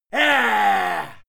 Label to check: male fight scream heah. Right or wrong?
Right